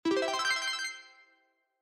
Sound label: bonus